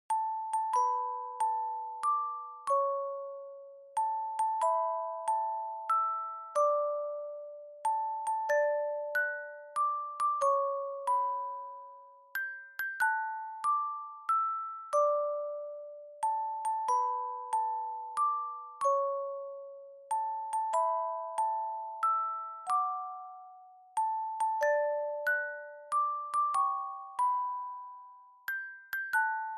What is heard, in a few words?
Dry Fast; Happy Birthday To You